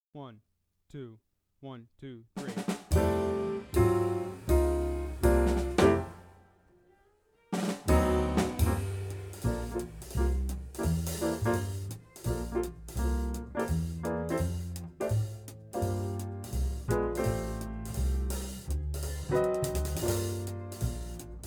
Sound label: Play-Along, Saxophone; Jazz Conception For Saxophone; 21 Solo Etudes (Eb Saxo)